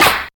Smooth Snare G Key, acoustic snare sample tuned to the G note. Loudest frequency: 3309Hz